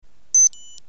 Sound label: IAQ Buzzer Alarm